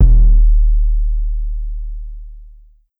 Kick; Headsqad; pitchglitch samples

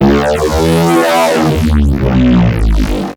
Neuro basslines

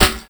Snare Jungle; pitchglitch

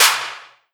Clap HiTech; pitchglitch

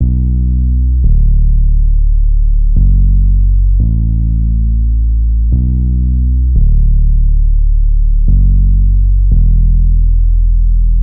Bassline 174bpm Bm, LIQUID; DNBACADEMY

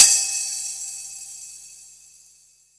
Bell Celtic Bell

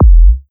Kick

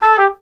Trumpet; pitchglitch samples; Emirdemirel

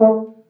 Horn Stac A; Samulis; pitchglitch

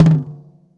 Tom Mid Roll; Lightning1024

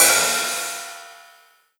HiHat Open; pitchglitch samples; Lightning1024

